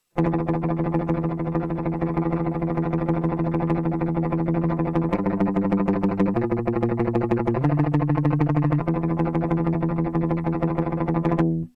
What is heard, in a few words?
thrash pick